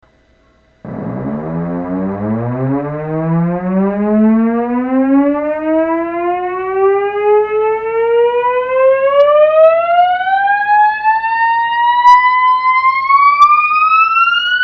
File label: Sweep, guitars